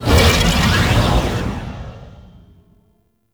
Shield Disable; Warframe; Archwing; ArchwingShield

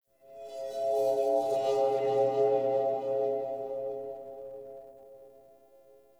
Texture Interior Medium; Ambience; Warframe; Sentient Lair

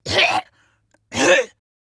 Coughing; Pacifist; Warframe; Evacuation

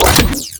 Briefcase Launcher Mech, Weapons; Warframe; Corpus

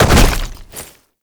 Land Heavy Rock; Movement; Warframe; Uriel

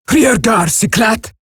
surprised, female; en; Eximus Grineer; Battle Barks

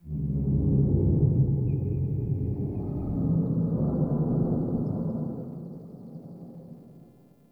Interior Texture Large Moan; Ambience; Indoor; Warframe; Infested Eris

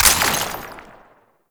Ammo Pickup Blast; Ammo Ability; Warframe; Warframes; Frumentarius

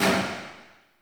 Tail Generic Interior Medium; Warframe; Weapons

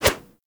Generic Flip Whoosh; Warframe; Characters

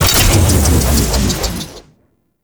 Timer Stop; Gameplay; Kahl Brother; Warframe; Veilbreaker; Devourer Kahl

